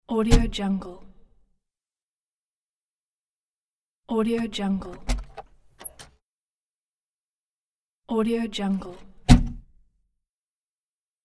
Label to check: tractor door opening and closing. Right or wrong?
Right